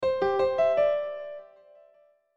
outgoing call

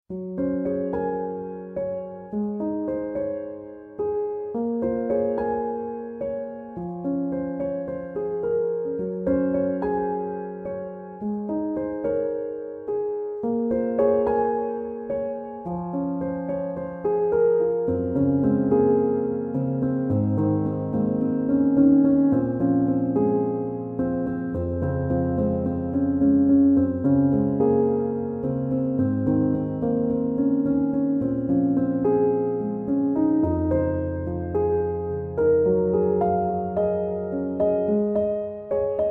piano instrumental

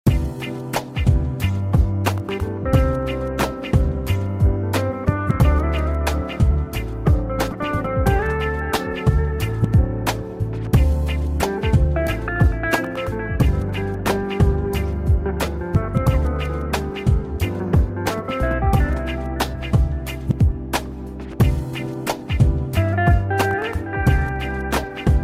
Relaxing Instrumental Jazz; Cena

